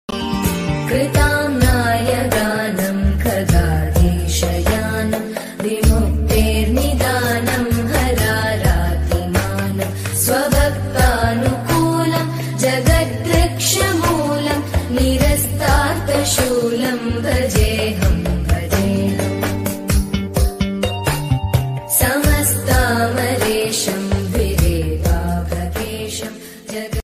Malayalam devotional ringtone, malayalam bhakti ringtone